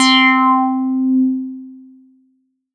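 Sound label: PLK C4, Roland SH-09 synth pluck; Leads and Keys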